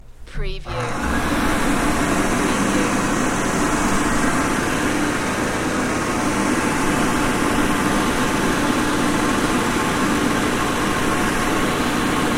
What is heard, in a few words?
Hand Dryer, Bathroom Sounds; household sounds